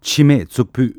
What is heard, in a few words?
chime tsukpu, tibetan